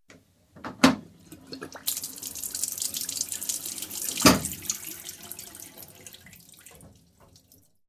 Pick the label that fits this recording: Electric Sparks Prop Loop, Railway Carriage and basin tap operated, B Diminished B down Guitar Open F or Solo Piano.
Railway Carriage and basin tap operated